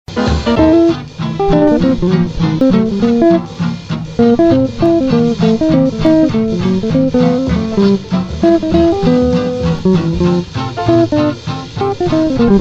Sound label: blues